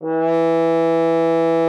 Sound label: FR HORN E3; Xsample VOL-3